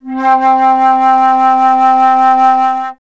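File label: flutes C3; Best Service; Peter Siedlaczek's Advanced Orchestra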